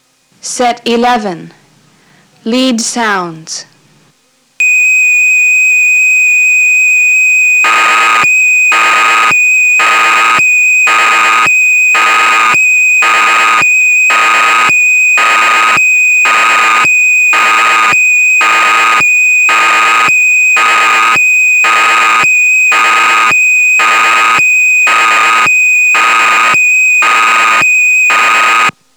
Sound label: Lead Sounds, dx9